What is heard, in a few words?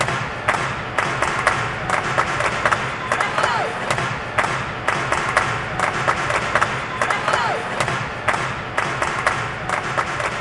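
Ambience Darts Crowd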